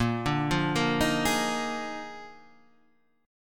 Eb Major 7th Suspended 2nd Suspended 4th Bb

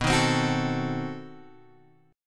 B 7th Flat 9th B Guitar Open F; B7b9; strummed

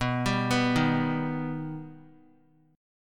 B Augmented B, chord; arpeggiated; JGuitar

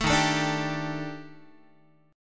D sharp Major 7th Flat 5th, down, Guitar; strummed; JGuitar

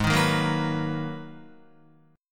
Ab Minor 9th Ab, Guitar; strummed; 4F,4A,5C,5G,5C,6E; 3,6,3,3,6,7; JGuitar

